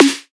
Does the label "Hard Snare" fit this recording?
Yes